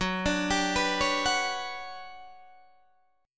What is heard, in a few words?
G Major Sharp 11th Gb, chord